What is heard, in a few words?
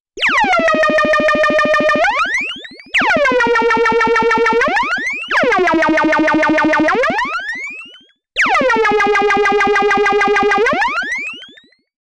MS-20 Underwater Laser FX; MS-20 Patches